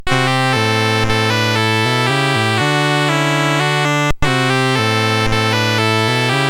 BASIC sid CSG8580R5

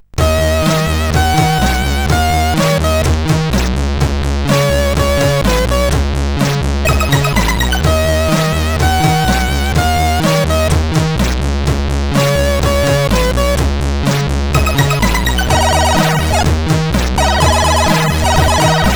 intro, CSG8580R5

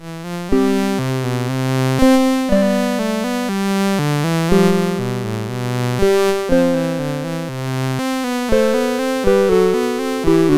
Bourree, MOS6581

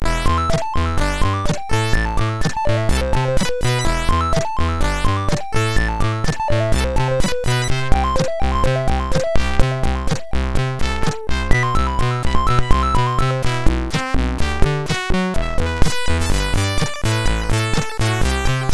Clik Clak, MOS6581R3

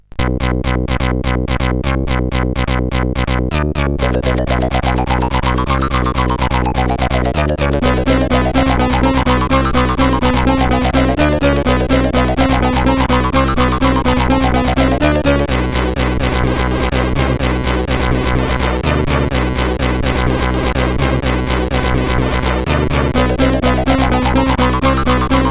Techno, CSG8580R5

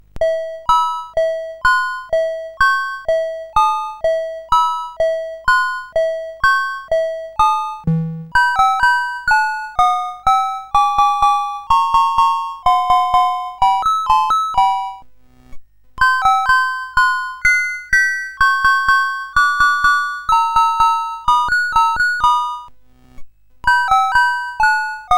CSG8580R5, GAMES; WitchSwitch

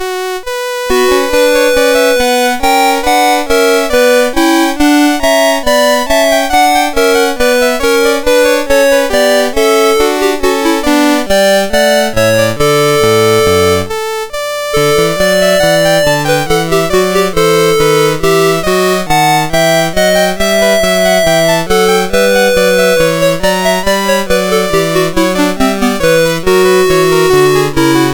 Orchestral Suite 2 Bourree I, MOS6581R2; Lord UK

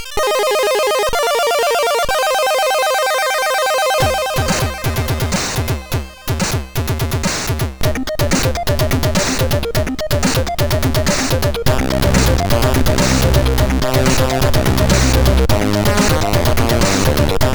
Chiptune, MOS6581R2; Kryo

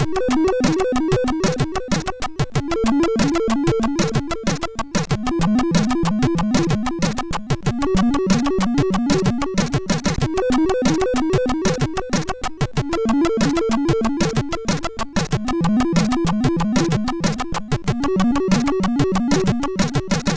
no bass, MOS6581R4

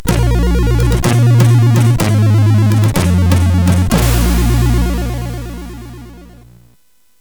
ingame sid CSG8580R5; Flummis World

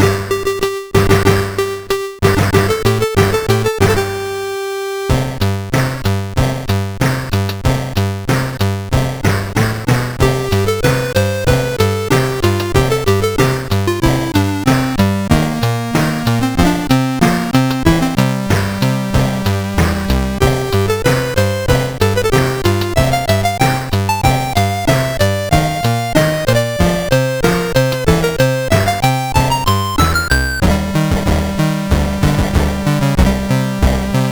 CSG8580; Super Slime Slalom Time

